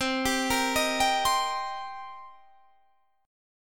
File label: D sharp 6th, C